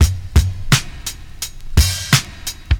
86 bpm drum beat D key, Free drum loop sample - kick tuned to the D note. Loudest frequency: 1904Hz